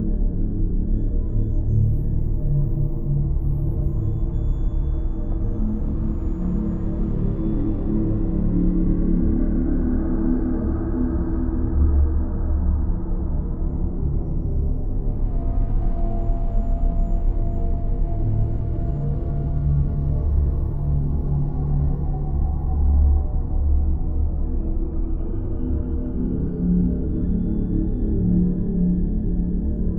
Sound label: ship habitat idle; Stellaris; toxoids; ships